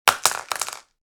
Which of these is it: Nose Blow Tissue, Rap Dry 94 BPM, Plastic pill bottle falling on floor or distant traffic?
Plastic pill bottle falling on floor